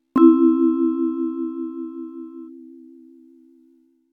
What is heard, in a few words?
question, notify